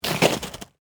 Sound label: Snow Crunch; Weather; Sound Effects:Weather